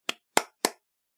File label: Three Hand Claps; human; body; Andrew Fleming